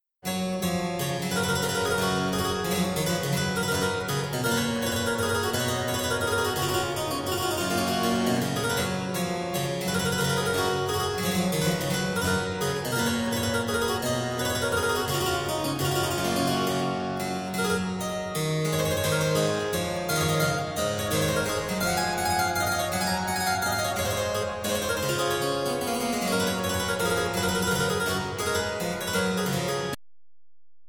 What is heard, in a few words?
Menuett, Cembalo